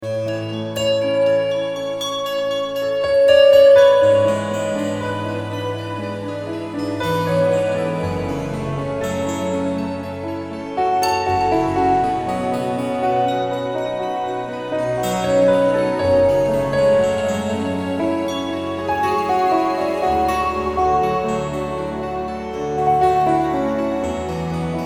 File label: The Sea 120bpm